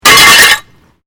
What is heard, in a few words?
Glass pane puncture; Material components for constructing more complex sounds; Materials; Foundation